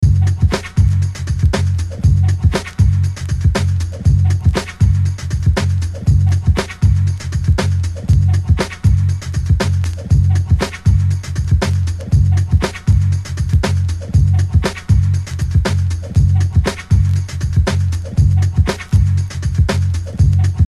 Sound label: Break